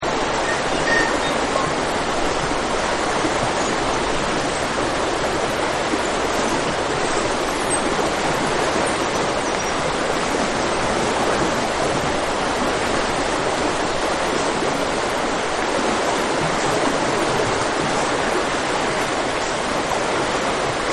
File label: Spring Water Birds; Nature Scenes; Environmental; Spring Water Birds 1